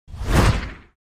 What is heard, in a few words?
Superhero take off